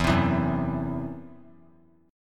E Suspended 2nd Sharp 5th, Esus2#5 chord; strummed

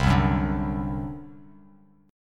C Suspended 2nd Flat 5th C down Bass Standard

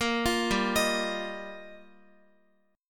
G Minor 7th Sharp 5th G, Guitar; arpeggiated; 4E,4A,5D,5G,5C,6F; Gm7#5

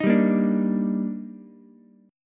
D sharp Major 7th, Ukulele; strummed; JGuitar

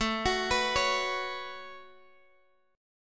A 6th Add 9th, Guitar, DADGAD; 19,21,21,18,x,x

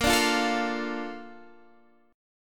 D Minor Bb, guitar; Dm/Bb strummed

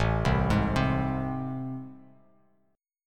A Minor Major 7th, down; arpeggiated; AmM7; JGuitar

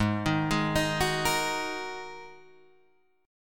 G sharp 7th Suspended 2nd G sharp Guitar Open D

